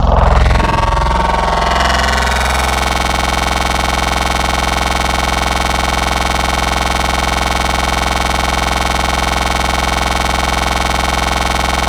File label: growl engine noise; Synthetic's Serum Collection; Echo Sound Works Core Tables